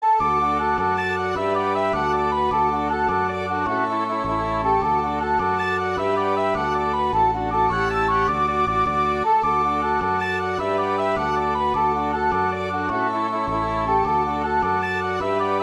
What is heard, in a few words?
Irish Jigs